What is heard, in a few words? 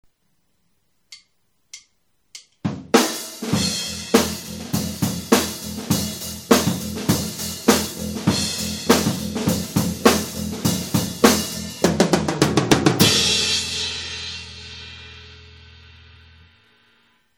ludwig gig; GIG-LITE SET